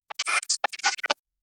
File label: tactical information disp; display